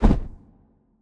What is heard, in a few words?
Hawk Flap